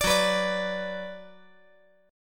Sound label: F sharp Suspended 4th Sharp 5th; strummed; JGuitar